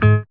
Synth Bass, Roland

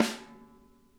snare, drum kits

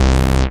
SYN SH, Roland; Sound & Vision - Gigapack I CD 2

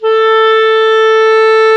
clarinet; Sound & Vision - Gigapack I CD 2 (Roland); Brass&Woods1

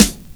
KIT, Dance Mega Drums, HIP

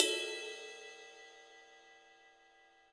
HiHat & Crash, Roland TD-20; HiHats

